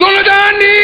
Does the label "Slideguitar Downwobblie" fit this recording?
No